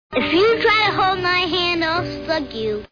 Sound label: hold hand